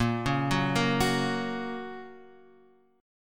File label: Bb Minor Sharp 5th Bb down Guitar Half Step Down; arpeggiated; JGuitar